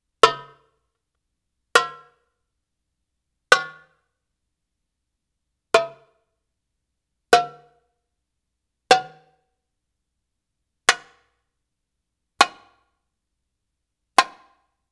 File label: Arabic Rhythms